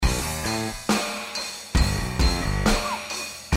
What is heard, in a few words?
drum bass plus w snare